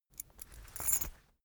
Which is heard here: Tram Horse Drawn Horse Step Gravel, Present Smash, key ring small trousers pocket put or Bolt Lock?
key ring small trousers pocket put